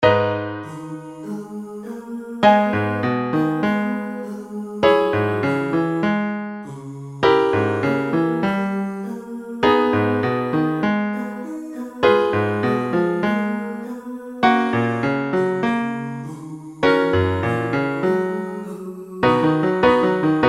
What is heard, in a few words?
Piano